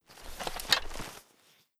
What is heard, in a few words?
nock